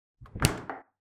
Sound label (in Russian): dverca holodilnika